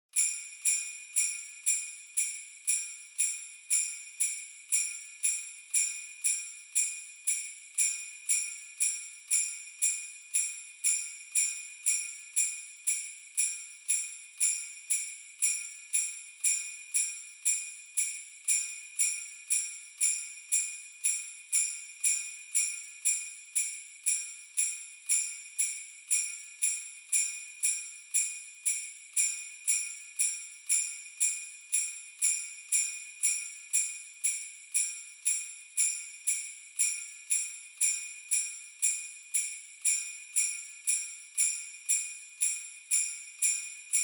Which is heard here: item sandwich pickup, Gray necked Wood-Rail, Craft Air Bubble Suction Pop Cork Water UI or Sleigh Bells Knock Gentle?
Sleigh Bells Knock Gentle